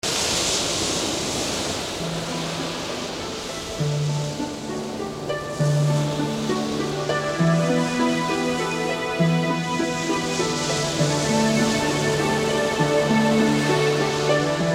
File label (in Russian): okean albom zvuki prirody